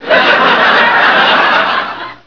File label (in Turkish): gülüyoruz, insan